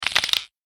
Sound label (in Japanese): 壁にヒビ